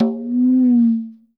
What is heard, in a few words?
Slow Conga Bend; Sonic Foundry (Sony Creative Software); Joe Vitale Latin Percussion